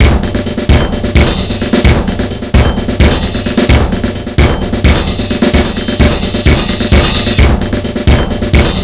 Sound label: march; INIS